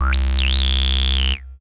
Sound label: BASS Aiwa Acid; Polyend; Legowelt